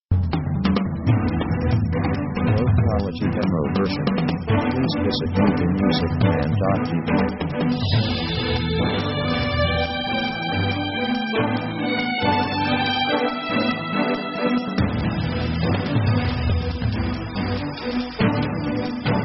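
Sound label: orchestra confident of victory; victory themes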